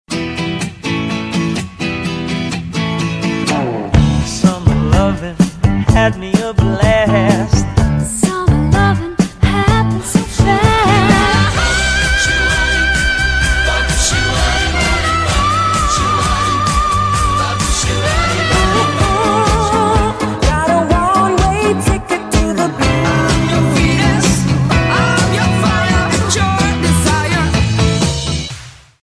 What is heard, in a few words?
70s disco ringtone